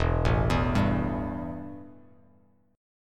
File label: E Minor Double Flat 5th E; arpeggiated; JGuitar